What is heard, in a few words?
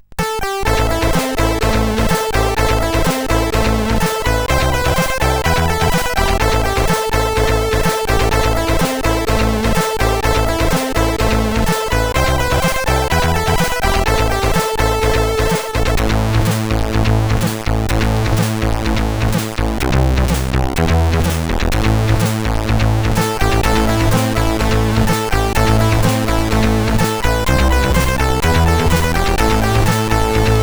Song, CSG8580R5